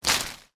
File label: foley bush leaves thick hard quick movement impact; zapsplat